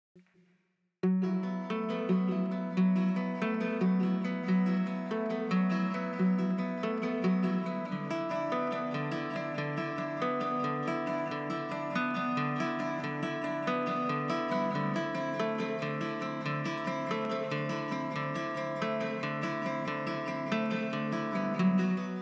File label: guitar